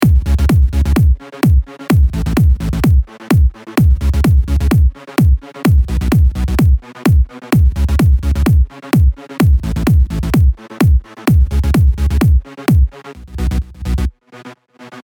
Ducker; psp echo

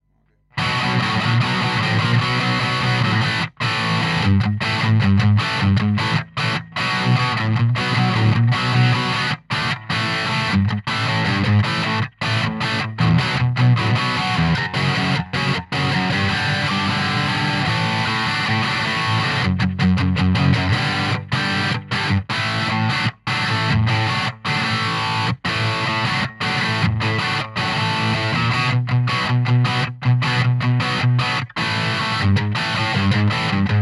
Code 100 JCM 800; marshall code 100 test

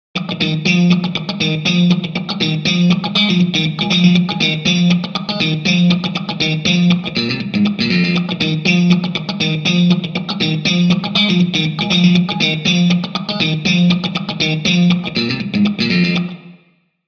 custom59 analog chorus; digital performer 7

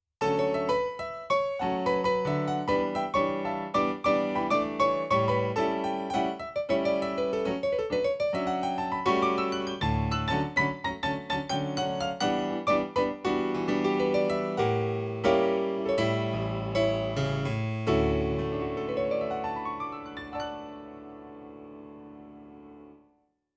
grand piano bright; Casio PX-S3100

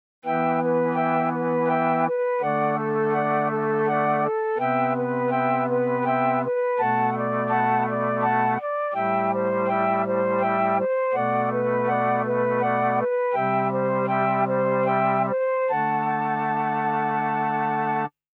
tape flute

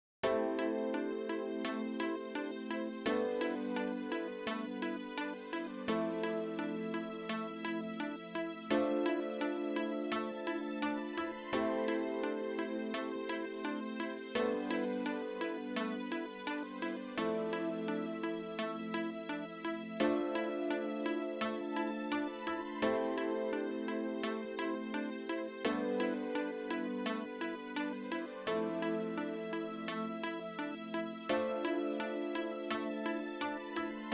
music uneqed; eiosis aireq premium

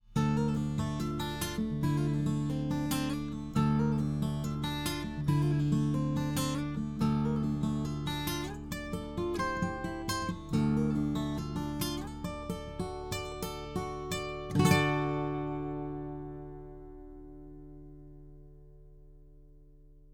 picking, fame pro series sd-100

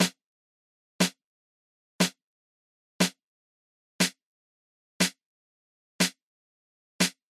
snare drum

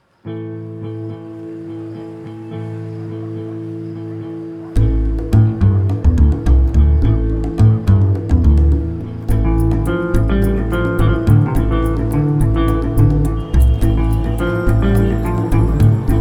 telematic percussiv upright bass x-treme fx atmo; motu machfive 3 testbericht

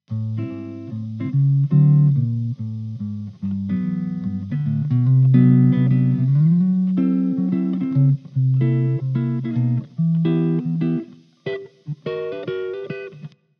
laroqua 212 studio progression closed

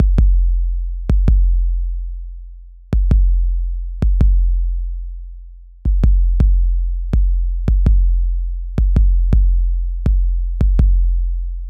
Kick; Trap-Beat

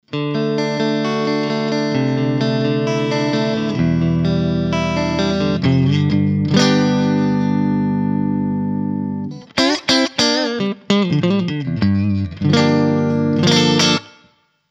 fernandes retrorocket pro neck middle

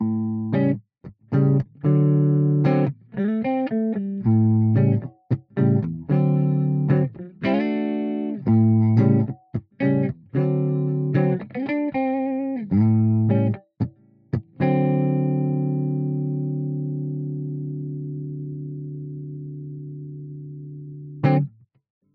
california style off axis weit; two notes torpedo pi-101 testbericht

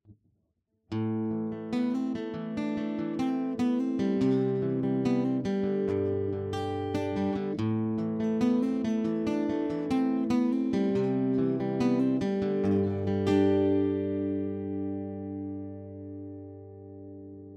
di acoustic martin o 18; Line 6 Variax JTV-69; 67er

